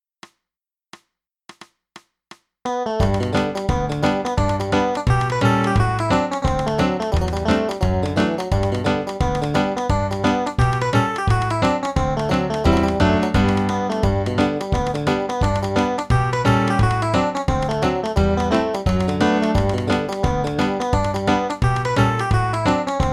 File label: Traditional Irish Reels G